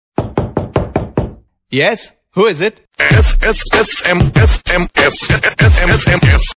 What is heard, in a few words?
incoming sms